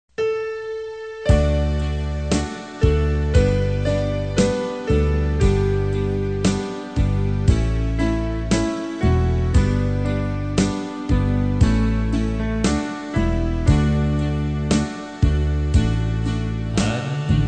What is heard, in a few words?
alto